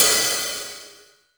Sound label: Hat Oh Lowie, Hats Samples; Drum Hits; Nu Dance Electro